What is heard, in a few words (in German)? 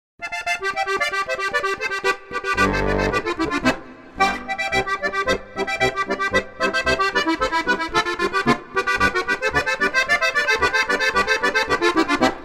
Salzburger Polka